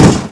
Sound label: crash wall; Stunt GP